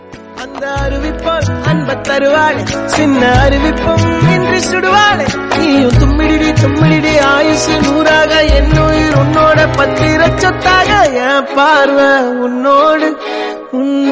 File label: Tamil Song Ringtone